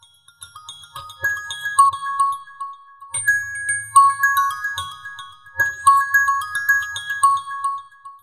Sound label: Vivo Y33t Official